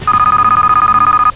phone

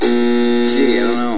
outta time

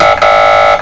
buzzer

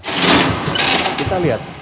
lever; indonesia

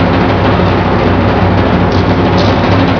timp; usa

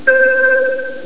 blue buzz

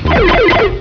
ringin; australia

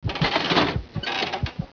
lever; india

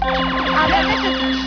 win game; usa

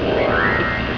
timer; usa

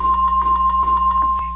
dings; usa; tpir

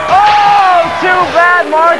uh oh times up

